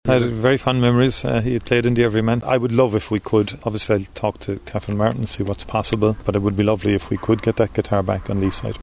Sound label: guitar; tan guitar